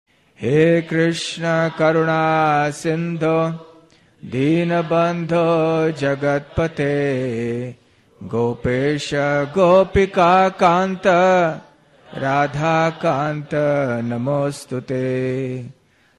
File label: Sri Krishna Pranama Sung; Pranam Mantras; HH Bhakti Rasamrita Swami